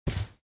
Socks single step on concrete

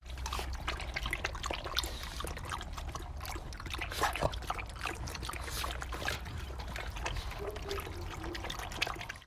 Dog drinking from bowl; Dog Sound Effects

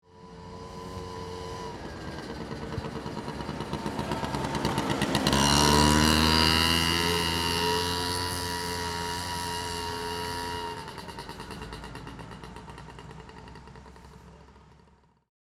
City scooter passing by close exterior

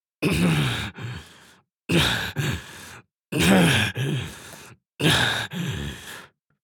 Heavy breathing anime villain vocalization

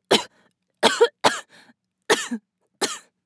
Young woman coughing

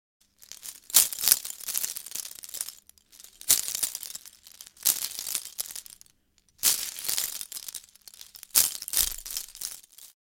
Seashell necklace jewelry movement

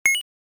Digital beep

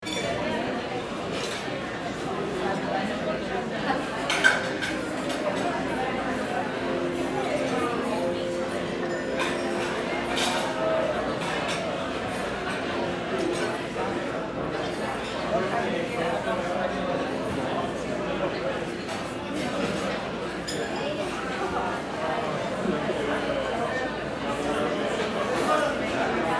Crowd chatter murmur restaurant

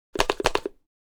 Soap dispenser bathroom pushing or pressing

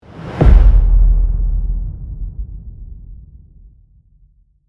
Deep intense boom rumble; cinematic

